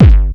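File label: bass drum distorted; 300 Drum Machines; Yamaha Motif ES-Series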